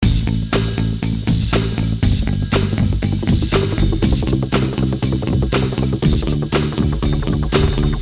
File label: depressing rock music